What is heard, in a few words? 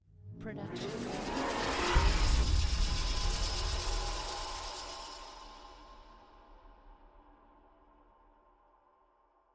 Fuzzy Lifter; soundscrate